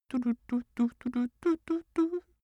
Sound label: cartoon male hum sequence